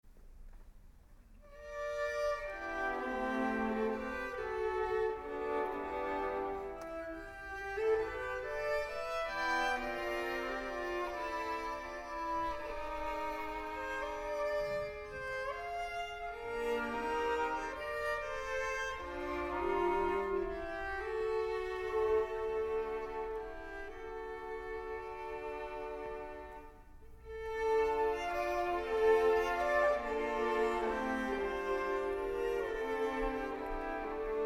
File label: flute dances schalmy